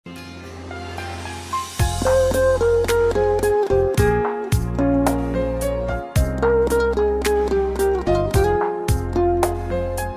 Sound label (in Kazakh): dombyra kuj; Күй; Халифа Алтай